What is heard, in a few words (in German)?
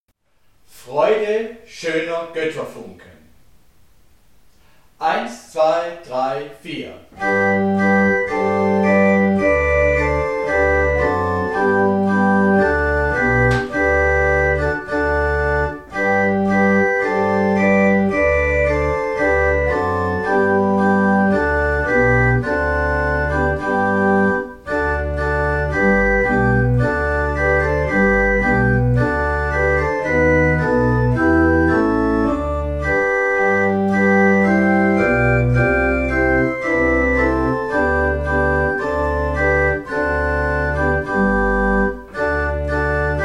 Freude schöne Götterfunken Orgel